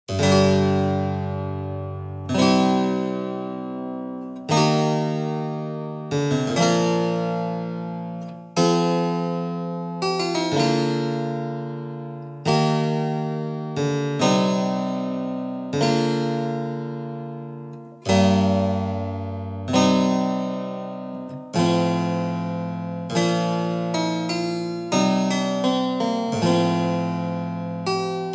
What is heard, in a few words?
Italian Harpsichord; Tastar de Corde; Dalza